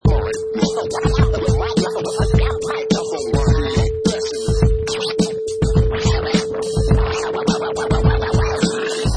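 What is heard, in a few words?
Scratch Groove 105 BPM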